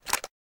slugger grab; sven; ragemap2022

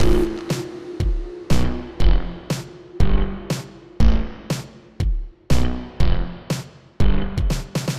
loop

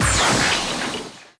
open door; sven; ambience; sc slumber

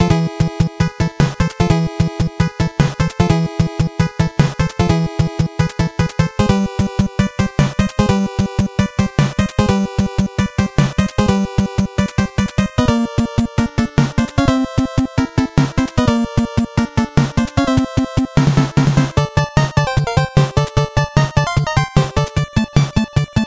loop; svencoop

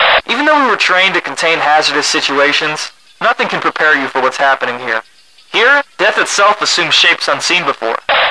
death

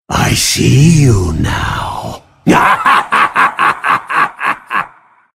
dead, grunt sounds

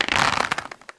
charge shoot, weapons; sven; cso; failnaught

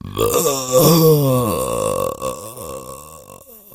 zombie pain; sven; russian sounds

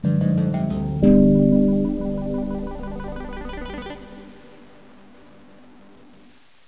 Level Up; svencoop; ambience; sc slumber

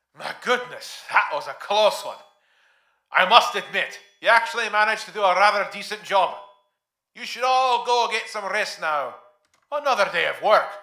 daily goal reached

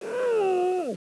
pain, player helmet; sven; bts rc

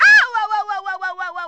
death; toad

